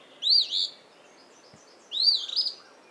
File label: eastern phoebe